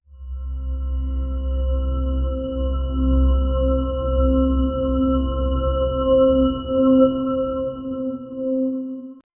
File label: surreal console intro; atmospheric